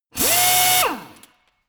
impactor hard burst

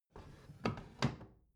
bathroom drawer close soft

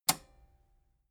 metal button robust spring release

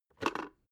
water boiler open top

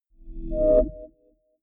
chord swell short

